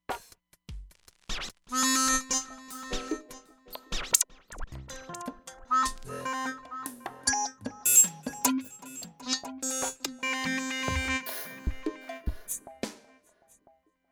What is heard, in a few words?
Arpeggiate, Roland Fantom XR; Drums